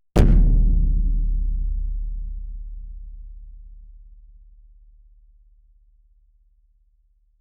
the sound of a large drum being struck with a boom